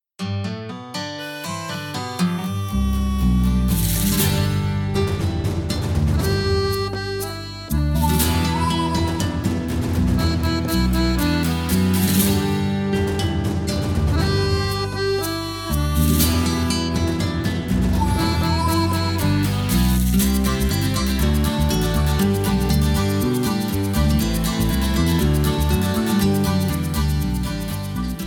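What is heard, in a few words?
instrumental version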